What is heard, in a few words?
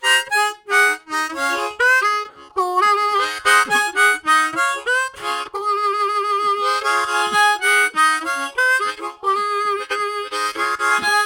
Chords To Singles, Harmonica Riffs; Zero G